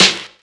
Acoustic Snare F# Key, snare one shot tuned to the F# note. Loudest frequency: 3391Hz